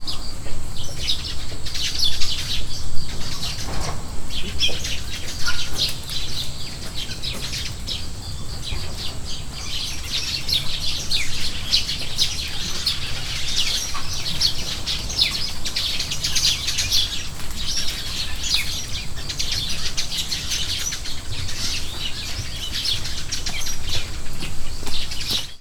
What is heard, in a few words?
bird